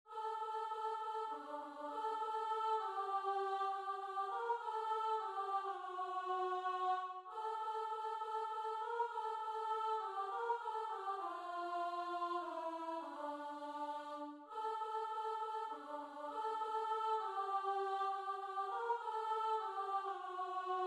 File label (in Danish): sopran